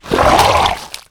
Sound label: Humongus Raptor Leaf Death